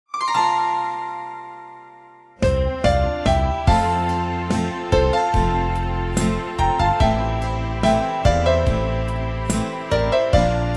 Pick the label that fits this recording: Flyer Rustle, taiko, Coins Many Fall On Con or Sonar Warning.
taiko